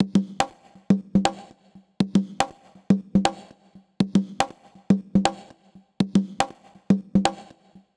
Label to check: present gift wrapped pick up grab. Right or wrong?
Wrong